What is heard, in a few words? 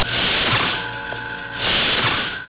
enterprise door